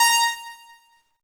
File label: normal hitwhistle